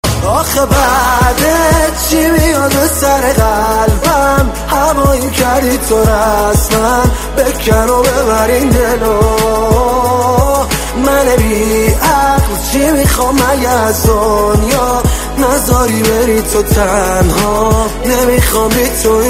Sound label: Mobile ringtone